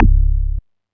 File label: boom; percussion; BDs